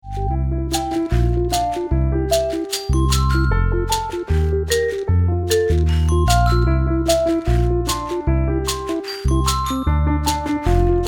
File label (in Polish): rap kropelek podklad z mel